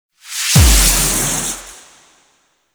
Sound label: Life Impact; Warframe; Dante